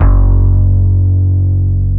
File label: BASS, F#1; Zero-G; Chemical Beats